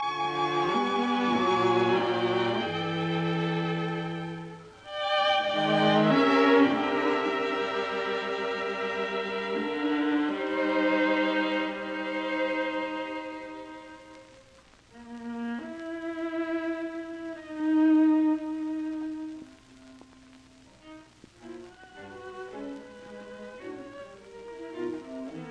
String Quartet Adagio; Schoenberg

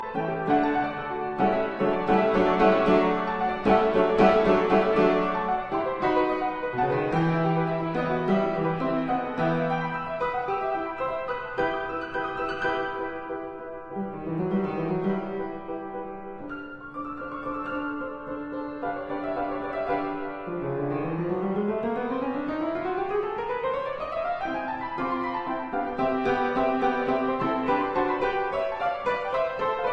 Keyboard Grande Sonata in C major, Rondo Vivace

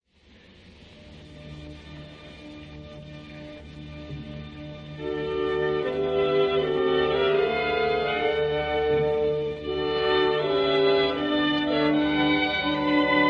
Violin Concerto in D, Allegro moderato; Busoni; Op. 35a

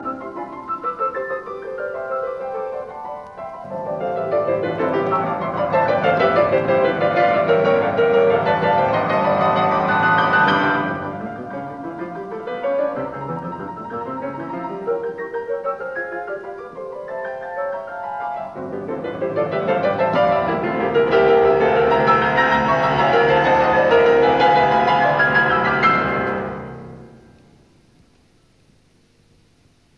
Variations in C major on a Waltz; 33 Variations; Op 120; Variation 10

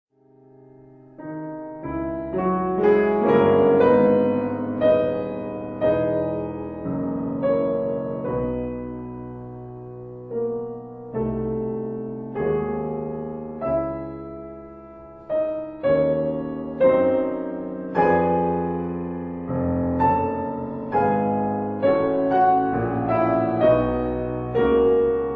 Variations for Viola and Piano; Joachim; Op 10

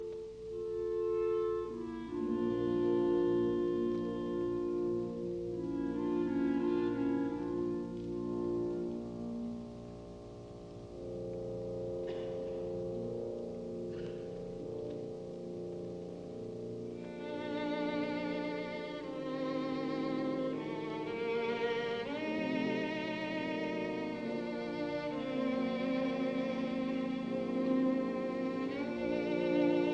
Violin Concerto in D minor, Adagio di molto; Sibelius; Op 47; Jascha Heifetz

